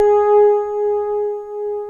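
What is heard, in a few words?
electro, electric piano; Greytsounds VOL-1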